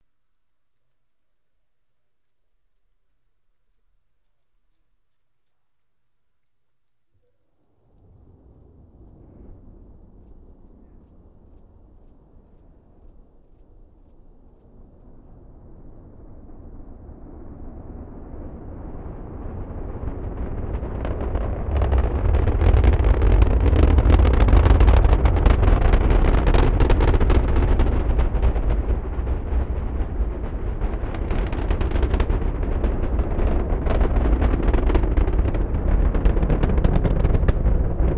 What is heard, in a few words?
Launch Sound Shuttle Launch Power Far